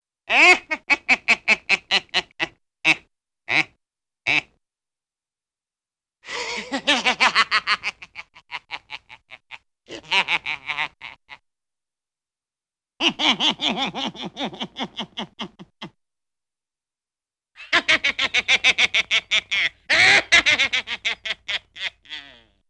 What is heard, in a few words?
Evil Wicked devilish little man laugh, Cartoon; Hollywood Edge; Cartoon Trax